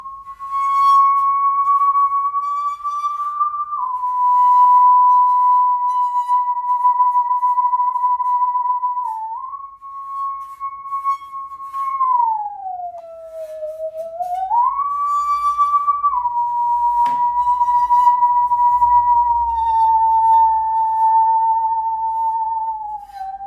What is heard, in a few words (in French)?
Scie musicale